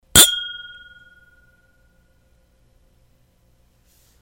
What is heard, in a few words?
Clinking Glasses